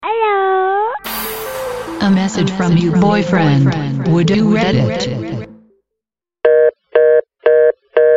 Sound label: Phone Call